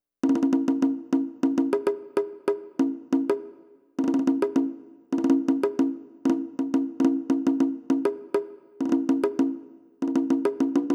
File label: perc 100